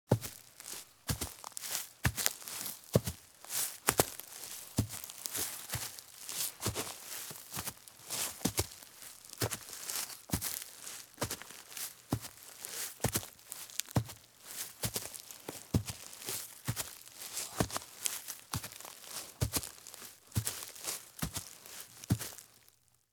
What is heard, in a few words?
Heavy Boots Walk at Medium Pace in Forest; Sound Ideas; Footstep Sound Effects